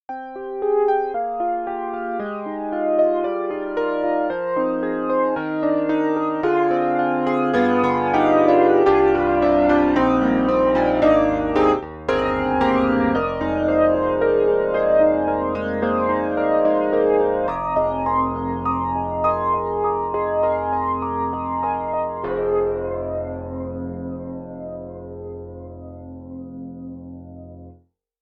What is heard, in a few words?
Yamaha CP80, Flanger, Wide Flanger; Steinberg; The Grand 3